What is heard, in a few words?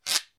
studio acoustic plastic shaker one shot; percussion